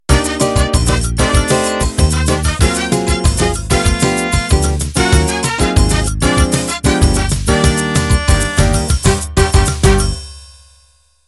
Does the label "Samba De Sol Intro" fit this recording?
Yes